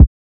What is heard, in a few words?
Thump Kick C